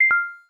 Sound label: cam stop; Lancet VS820